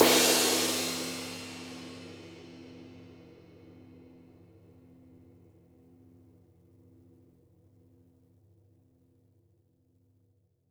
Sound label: flat ride - crash; JazzFunkKit